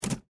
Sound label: Typewriter Space